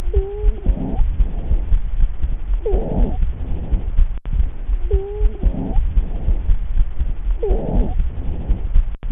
肺部听诊 哮鸣音

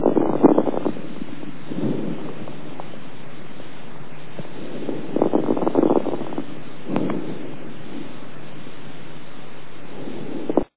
湿罗音捻发音

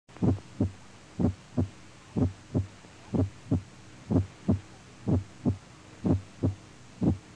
奔马律-急性心肌梗塞恢复期

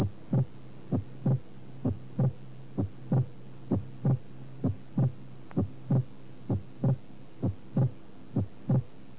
第二心音分裂

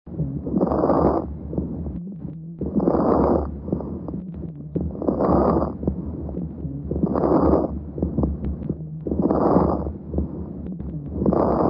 中湿罗音伴呼气相哮鸣音

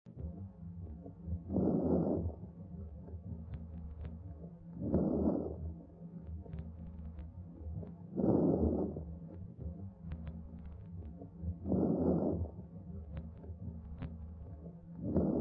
肺泡呼吸音

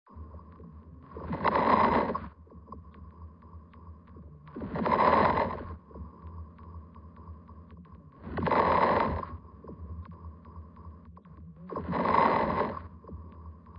胸膜摩擦音

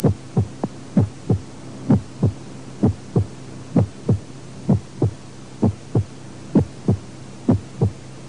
完全性房室传导阻滞第一心音亢进

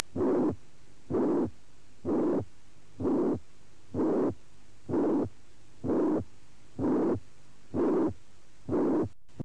室间隔缺损

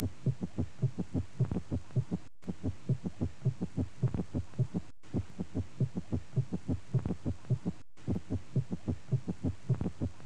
重迭性奔马律

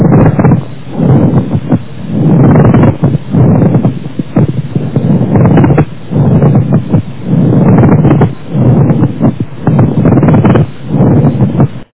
胸膜摩插音

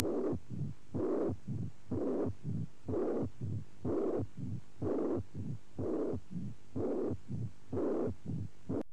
二尖瓣狭窄

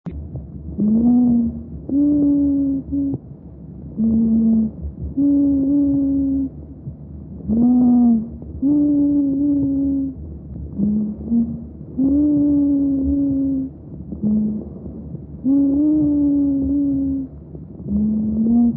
双向哮鸣音

